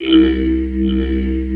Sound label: Brush Loop 2; Mo' Phatt; Digital Sound Factory Mo' Phatt